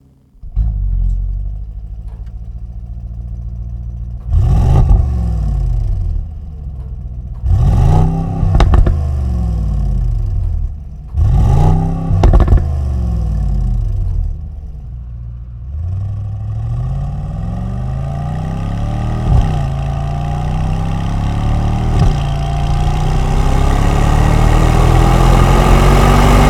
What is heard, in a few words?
STOCK EXHAUST SYSTEM MERCEDES AMG GLE 63 S